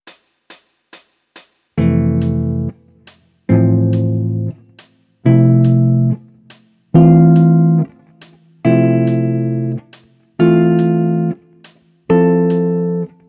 Jazz; Guitar Techniques 2019 Issue 296 June

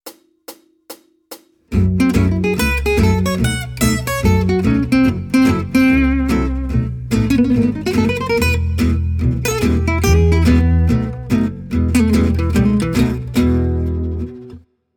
Jazz, Guitar

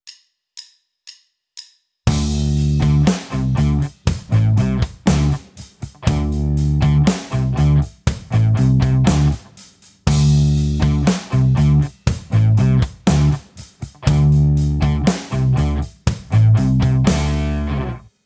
Tapped Harmonics, guitar

